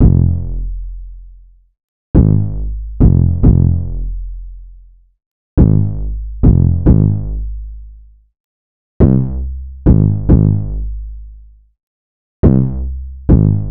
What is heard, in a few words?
808 loop 140 Fm; Slate Digital BASSHOLE Sample Pack